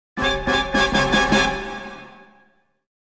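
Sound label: Stinger Heavy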